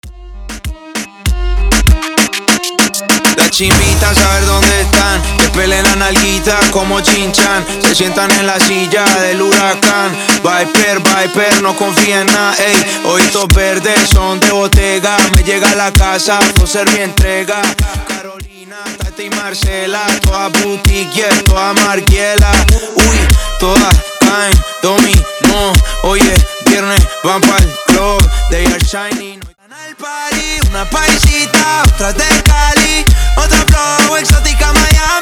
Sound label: Party Wordplay Segue Reggaeton 98 BPM